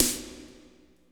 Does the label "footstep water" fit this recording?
No